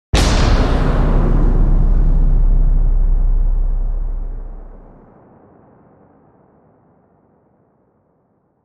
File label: Huge incendiary explosion.; Bombs; Explosions